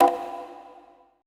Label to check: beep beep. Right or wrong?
Wrong